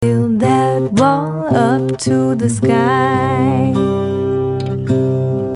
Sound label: Game Tune